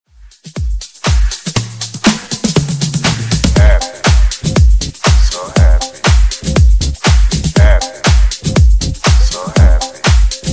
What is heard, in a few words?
Funk Grid 120 Bpm